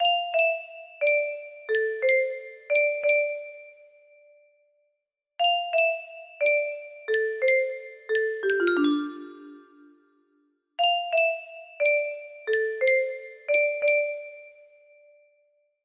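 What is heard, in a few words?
music box melody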